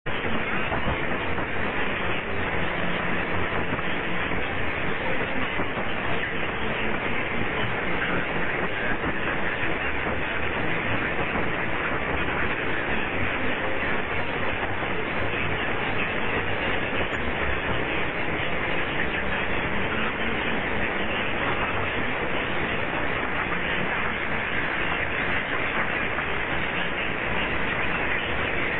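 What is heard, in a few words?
Star FM Portugal, radio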